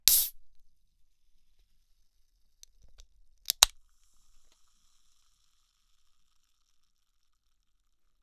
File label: Tin Cola Open; Bar; Qbsound Studio; Bar Effects